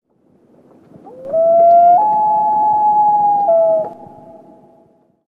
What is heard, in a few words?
wail, loon